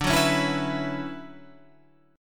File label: D Major 13th D down Guitar Half Step Down